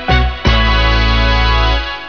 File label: hiScore; Munchies 1.0.6